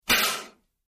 Air Mortar Blast From Close Point of View, At 100 Pounds Of Pressure, X3; Fire Extinguisher